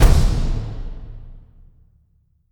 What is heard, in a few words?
Alert End